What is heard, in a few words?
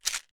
Pills